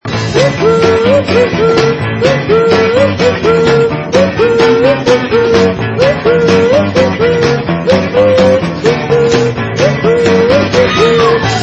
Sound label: WooHoo